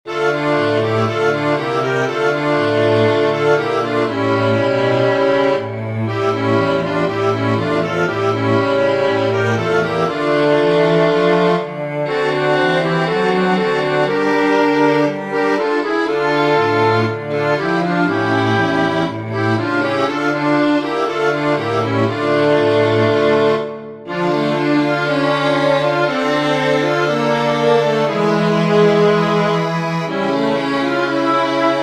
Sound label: Canzone arrabiata in Dm vier stemmen